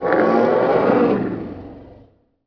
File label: alert; unreal; titan